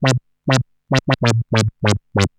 Arp D Line, ARP Odyssey; musicradar-synth-samples

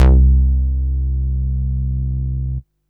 Basses C; Vengeance Samples

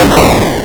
Arcade Riffs

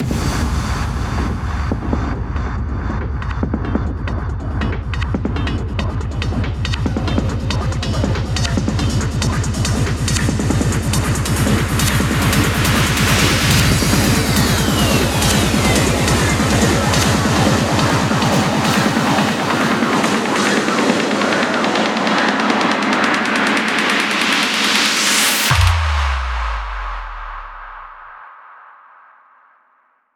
140BPM 16Bars, HandsUp & Trance Fills; Extasy; Vengeance Samples

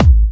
Hard Kick; Vengeance Samples

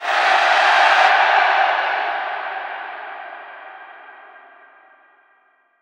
Reverb Athmospheres, FX Vocals; Vengeance Samples

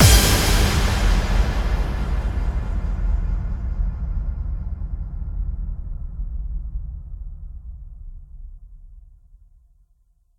bassdrum reverb FX kick; Vengeance Samples

